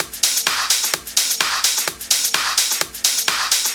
House Loop, 128 BPM; Vengeance Samples

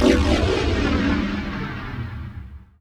FX Downlifter

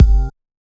Kick; Dubstep Sample Pack; BassDrums

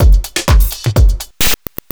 drum loop 125, vocal house; PS-28 Vocal House Samples; drumloops; subcompilation

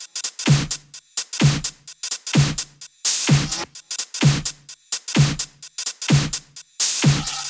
128BPM Kit; Vengeance Samples; VFH1 128BPM Australian Kit

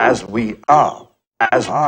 House Loops, Vocal Loops House 128BPM

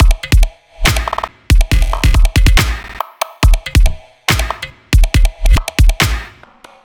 drum loop, Dubstep; Dubstep Sample Pack

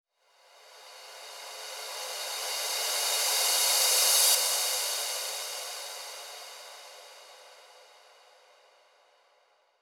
ReCrash FX 138BPM; Sound FX; Dminor

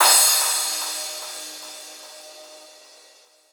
Percussion, trap

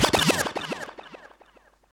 Vinyl FX and Scratches, FX Scratch; Vengeance Samples

